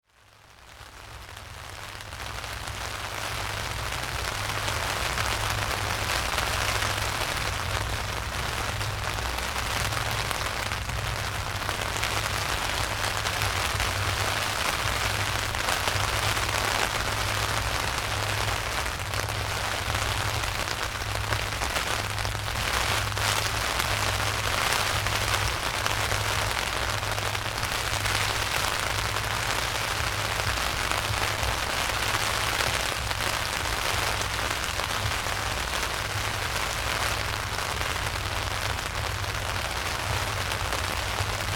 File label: rain on tent